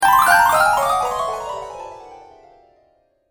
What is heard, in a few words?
Spell Of Laughter, magic sound effects